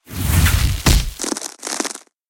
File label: Ice Golem Break arm; 戈仑冰人